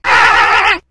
kamikadze walk

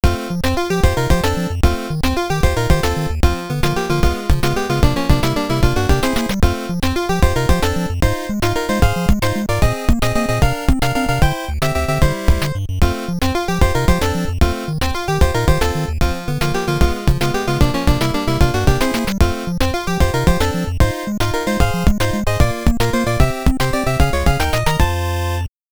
Game Set BGM; konami famicom chronicle vol.1 disk system edition; Domestic DISK Ver.

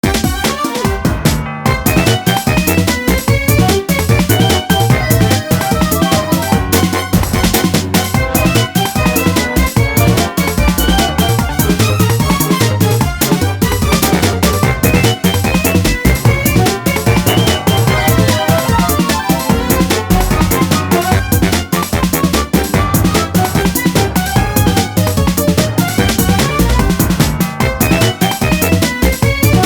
Jazzy; Mario Party Superstars

